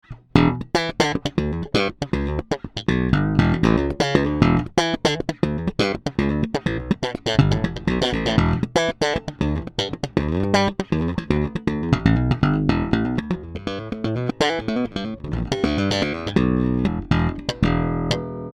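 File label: JB2 Slap Bridge Pickup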